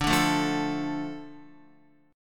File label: D Minor D Guitar; Dm strummed; JGuitar